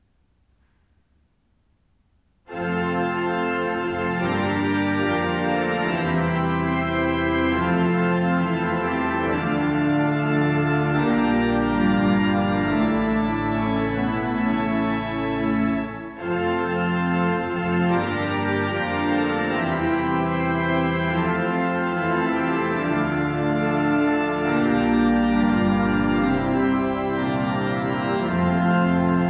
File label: Fanfare; st johannes svg